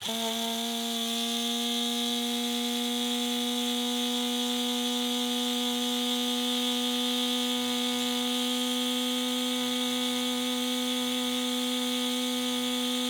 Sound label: Aircrafts Bf-109K-4 Governor; DCS